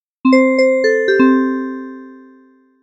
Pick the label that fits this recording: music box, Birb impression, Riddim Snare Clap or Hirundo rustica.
music box